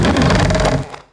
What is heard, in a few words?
root hit; DAoC; Toa; Gamestar